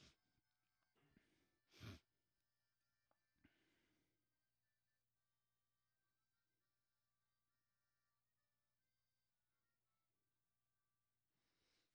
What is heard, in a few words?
vocal